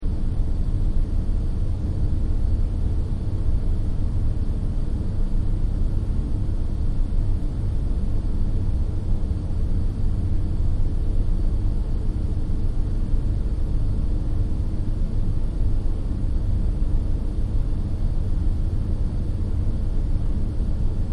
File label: Room Tone Computer Air Con; Backgrounds